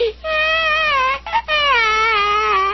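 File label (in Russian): плач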